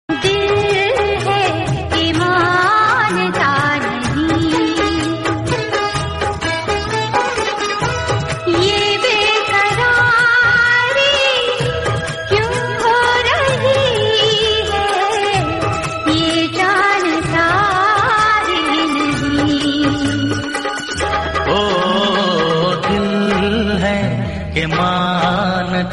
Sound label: Romantic Hindi Bollywood